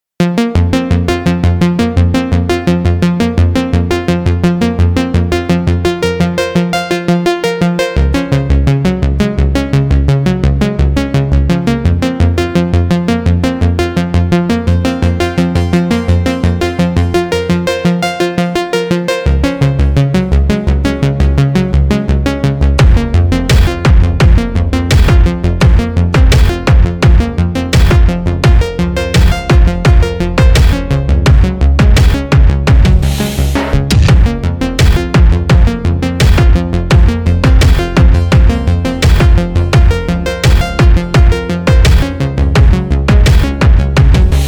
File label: SYNTH